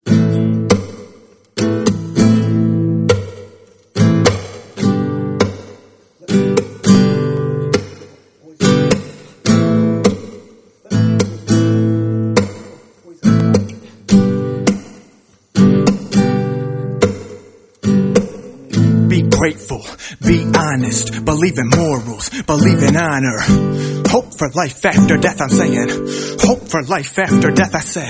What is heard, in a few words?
acoustic